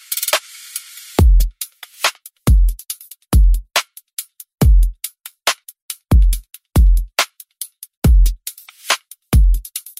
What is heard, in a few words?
drum; signal